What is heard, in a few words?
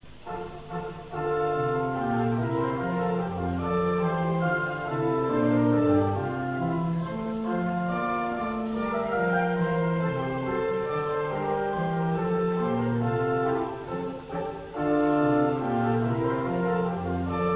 gavotte